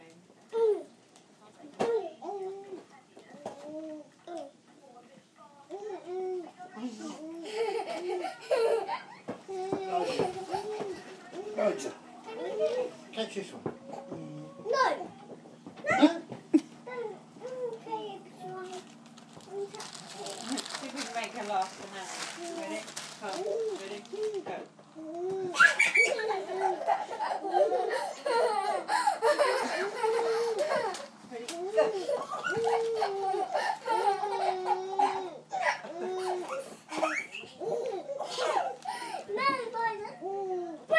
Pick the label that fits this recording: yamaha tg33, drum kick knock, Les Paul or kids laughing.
kids laughing